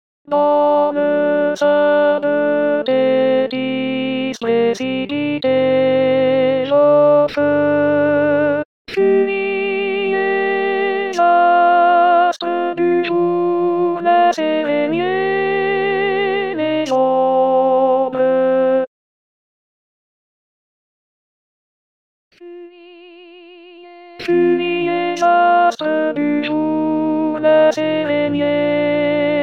sein thetis alto; 2022 st jean

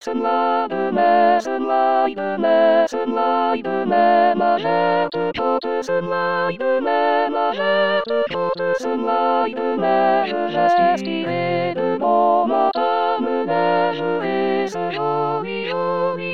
sopran; rimailleurs

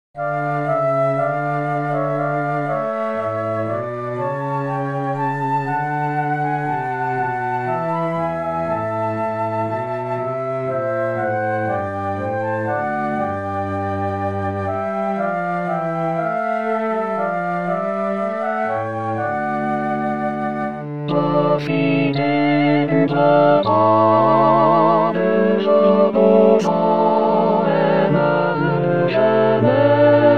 printemps; 2023 st jean

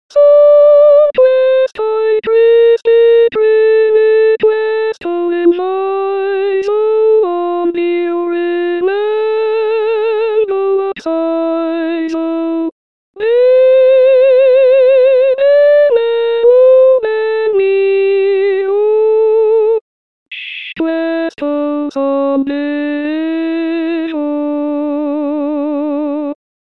canzonette sopran; 1ton dessous

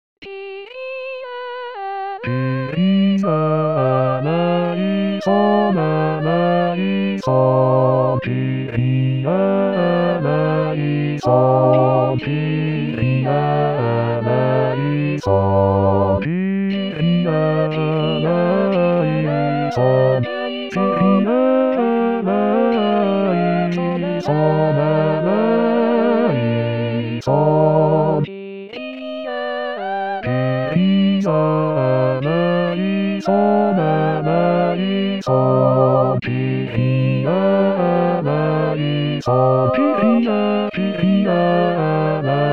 kyrie basse; 2023 st jean

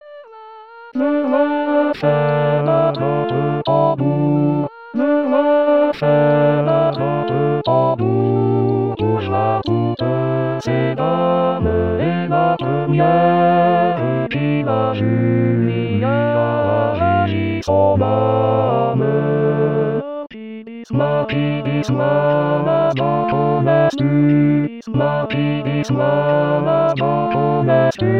leroi bas; 2023 st jean

